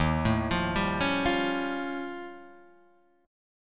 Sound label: Eb 9th Flat 5th, Guitar, Drop D